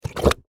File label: Suction Mud Gurgle, Texture, Suction, Wet, Gurgles, Mud; Water Sound Effects; Water (Sound Effects:Nature)